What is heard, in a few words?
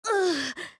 FEMALE damage